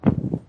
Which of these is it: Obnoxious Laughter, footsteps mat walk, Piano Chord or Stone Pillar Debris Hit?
footsteps mat walk